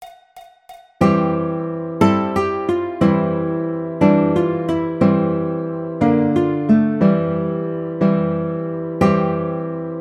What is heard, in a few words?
Baritone, ukulele